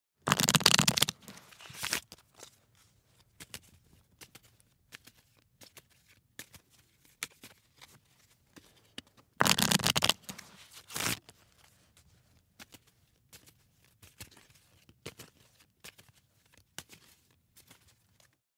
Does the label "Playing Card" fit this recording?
Yes